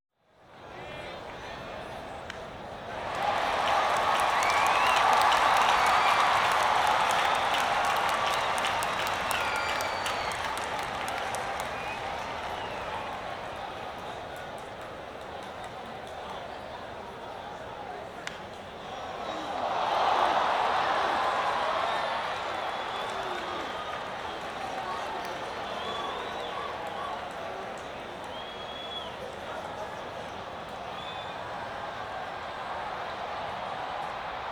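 Crowd Baseball Stadium, Exterior Atmosphere Ambience With People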